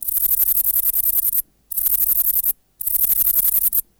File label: song diabolica, katydids neduba